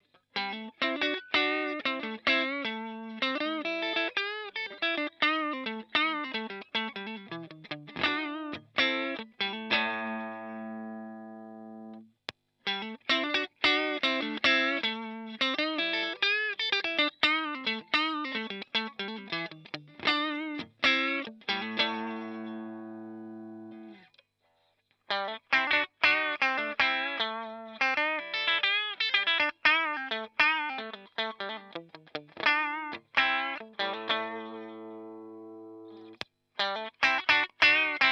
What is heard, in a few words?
clean lead TV